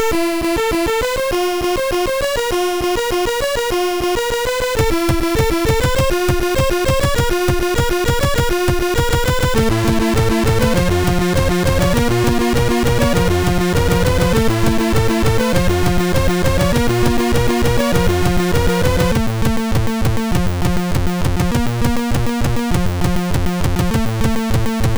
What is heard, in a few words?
Cover Motiv, MOS6581R3